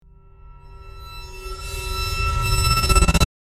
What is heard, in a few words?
Cinematic whoosh glitch and cut